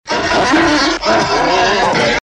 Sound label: roaring, animals; sound effect